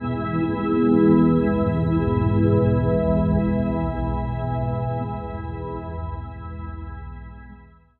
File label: glucose organ